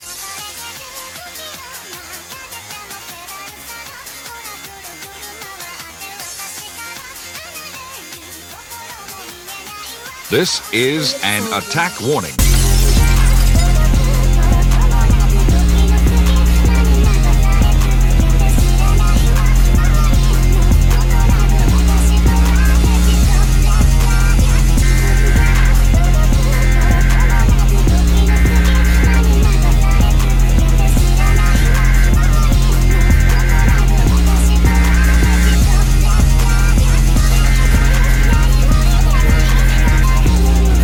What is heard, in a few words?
808s